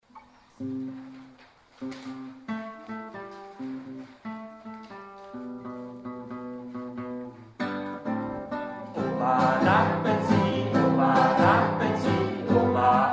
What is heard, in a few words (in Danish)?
Sang